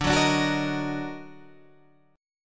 Eb Minor Major 7th D, chord

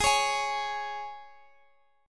C sharp 5th, G sharp, guitar chord

